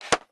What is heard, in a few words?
report sound